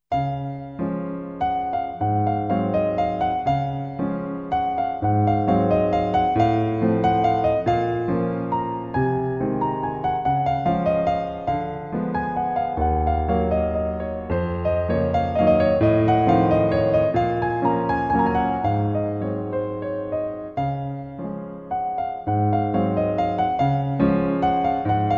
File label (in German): walzer des, Klavier Musik Piano Solo